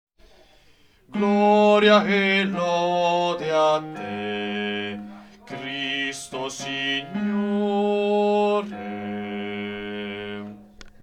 Gloria e lode bassi, coro

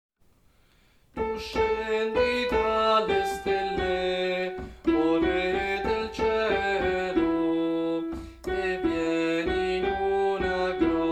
Soprani, coro; Coro Isola del Giglio; Natale

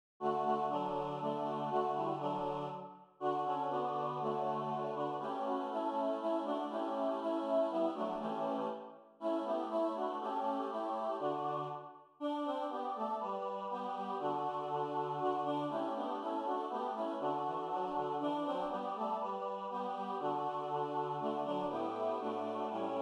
Tutti

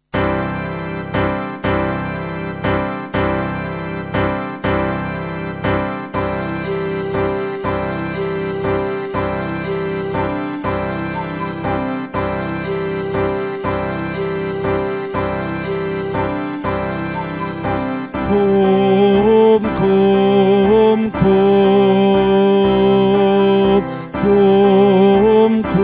baritoni, coro; Carmina; Swaz hie gat umbe

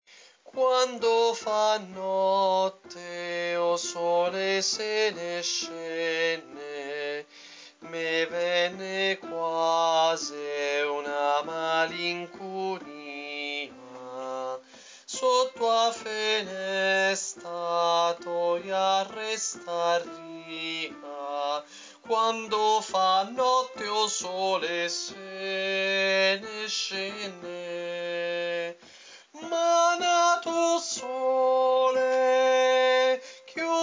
soprani, Coro; Coro Isola del Giglio

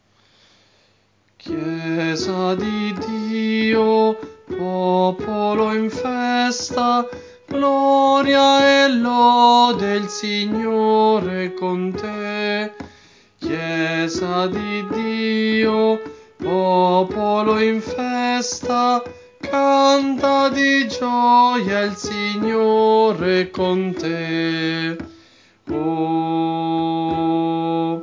Chiesa di Dio Soprani; Coro Isola del Giglio; Giovedi Santo

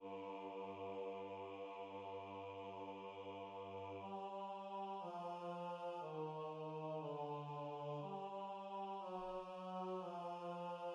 basso; Ninna nanna russa

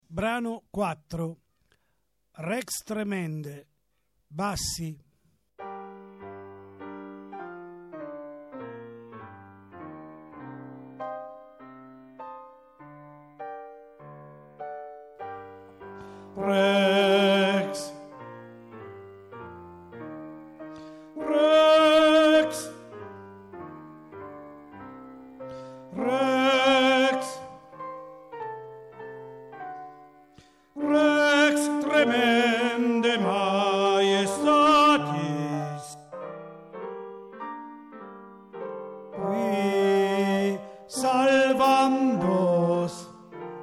rex tremendae bassi; Requiem

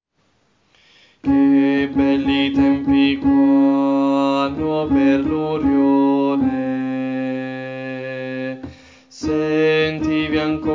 contralti, coro

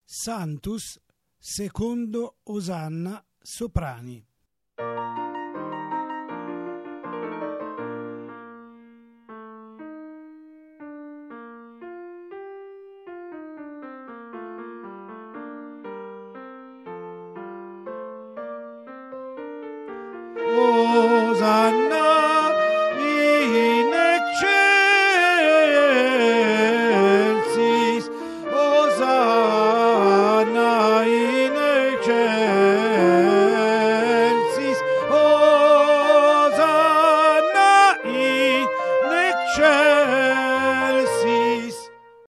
secondo osanna soprani; Requiem

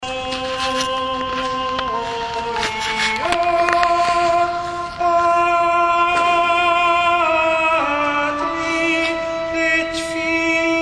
Laudate Dominum contralti; Mozart Vesperae Solennes De Confessore

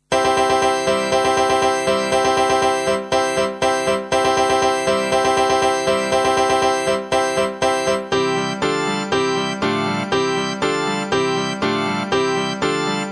bassi Were diu werlt alle min; Carmina

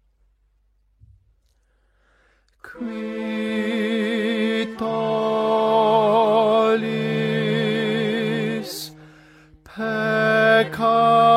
tenori; GLORIA VIVALDI

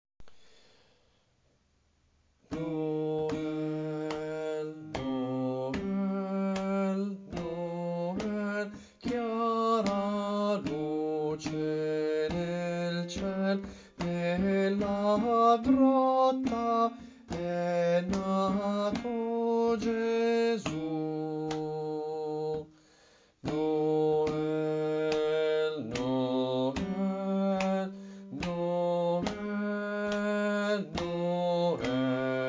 Noel bassi

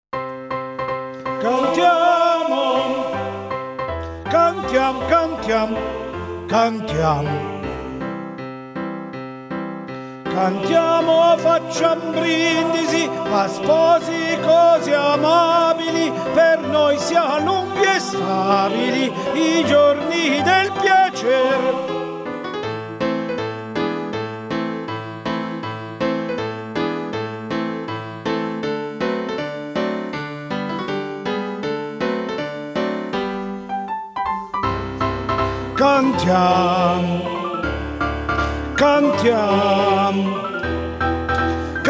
Tenori

